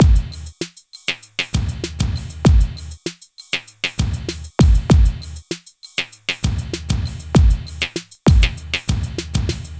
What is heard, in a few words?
Beat, Drums & Percussion; Electrocution; Beats